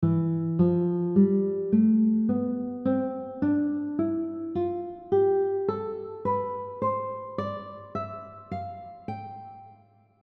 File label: C Major shape 1 12th fret, guitar; C Major scale guitar shape 1 fretted notes